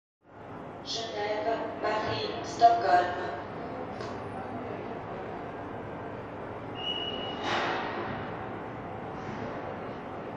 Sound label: Train Light Activity Geneva Station Train Into Geneva St; Hollywood Edge 3-D SFx